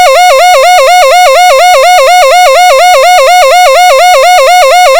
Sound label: yelp tone